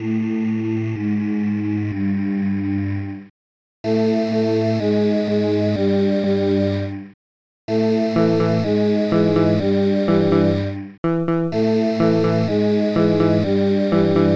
tune; jetsetwilly3

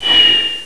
whistle; M4 7.5.2; M4™ (PowerMac)

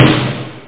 power snare

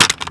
gun drop, weapons foley; Spearhead Demo; MacFormat 2003 June

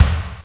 KICK; Descent

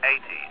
eighteen, chime

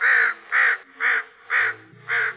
CROW; SpecOps2 MPlayer Demo

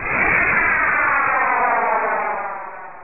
Meteor; dithell in space

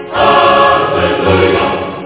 win sound; skidracer

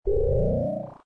plop; Arcade Lines

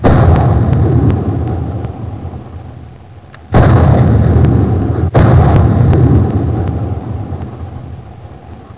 GUN

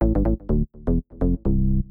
SNTH BASS PROGR 125 A; eJay

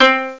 koto sharp; Amiga Special - Software Hits; orchestra; keystring

